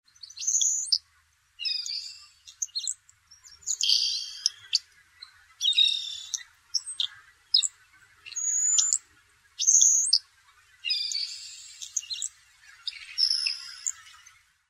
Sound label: saltmarsh sparrow